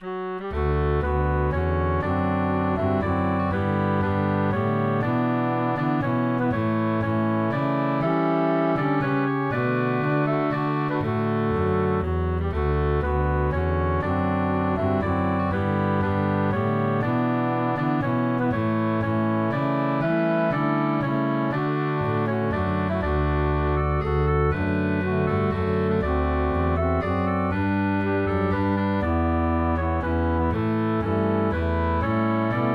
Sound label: Melody Harmony Bass; Black Hawk Waltz